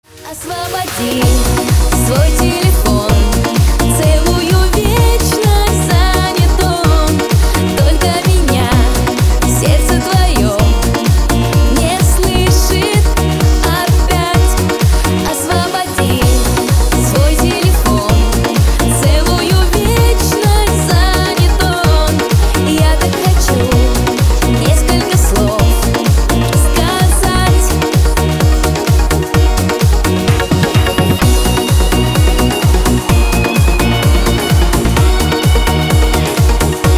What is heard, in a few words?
radio remix